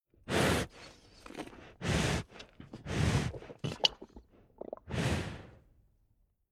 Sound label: Animal Eating Beef Bull Heavy Breathing And Gutteral Noise Monster; Tom Hutchings